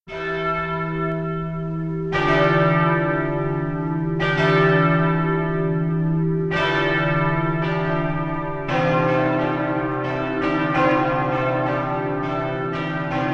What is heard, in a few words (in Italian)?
Campane